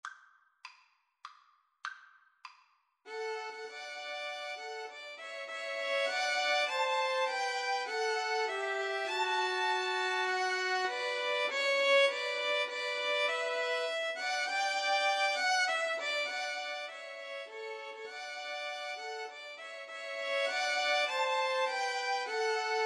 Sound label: nocturne VLNs